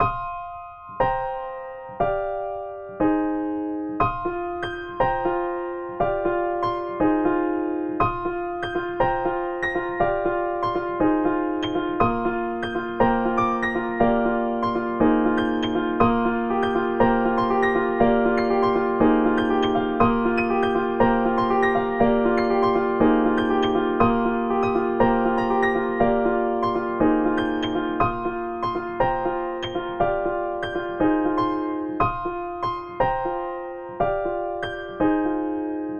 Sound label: music snowy